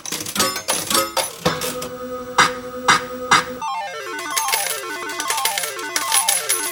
slot machine; Golden Crayon Sounds

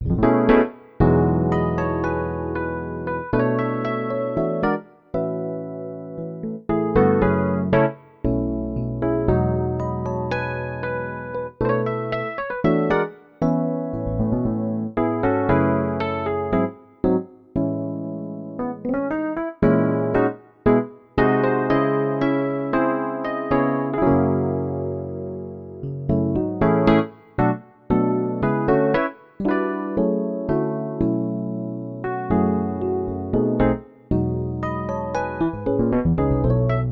e. piano, Yamaha NP-30; Demo Songs

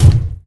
bathtub land; Golden Crayon Sounds

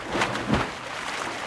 swimming; RS Games Sounds; Monopoly

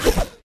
grappling hook yank; Golden Crayon Sounds

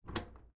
door; Original TK Sounds

